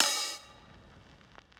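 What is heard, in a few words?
open hi-hat; eddyflux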